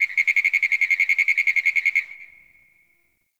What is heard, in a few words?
Night Tree Critters; Warframe; Eidolon Plains; Ambience